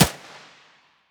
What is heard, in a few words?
muzzle mono distant